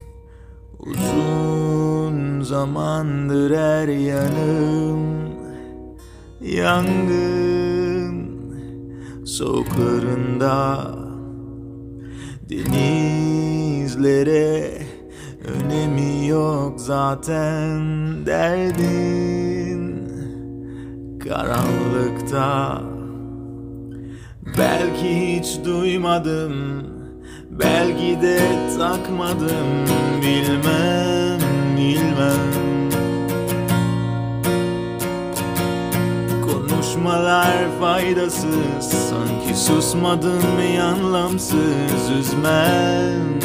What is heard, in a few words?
Akustik Cover